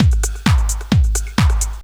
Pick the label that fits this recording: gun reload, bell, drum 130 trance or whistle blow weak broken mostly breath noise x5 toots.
drum 130 trance